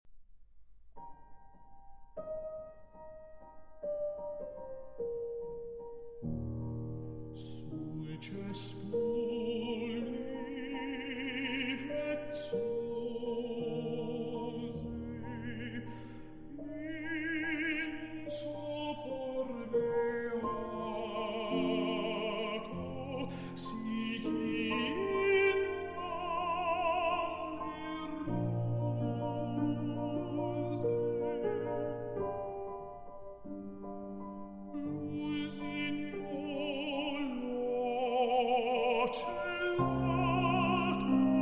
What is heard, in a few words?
Notturno in E-Flat Major